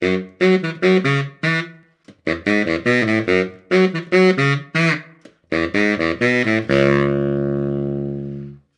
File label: Drama Pickup; simpsons hit run; ps2